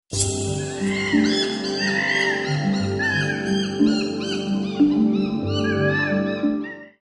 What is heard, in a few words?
Out Of Africa Music And Animals World Beat Ringtone